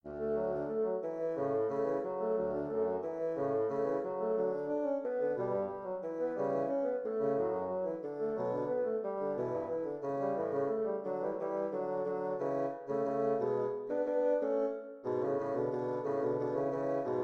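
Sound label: duetto in D major 2 bassoons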